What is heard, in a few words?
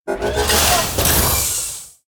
Scrap Golem Attack